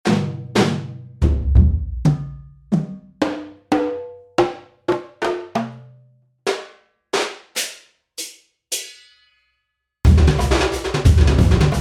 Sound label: singha baja playable kit, streets of north india; sonic atlas; pitch innovations